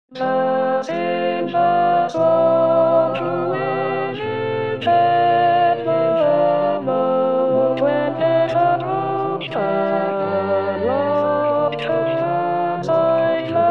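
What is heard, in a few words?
Silver Swan Alto 1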